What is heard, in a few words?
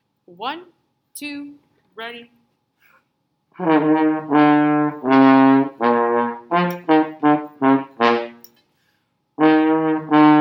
gof trombone